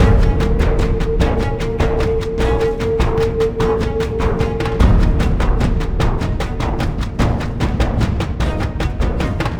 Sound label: Combat Loop